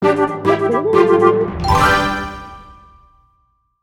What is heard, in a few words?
Golden Hat Unlock